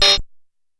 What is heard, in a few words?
Hat Lo, Stylophone Beatbox